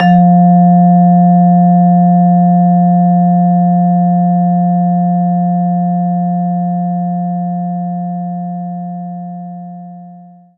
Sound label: Vibraphone F3